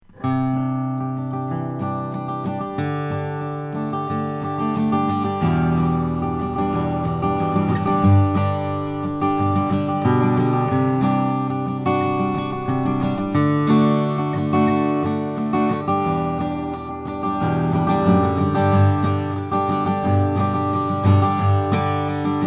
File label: doolin om indian rosewood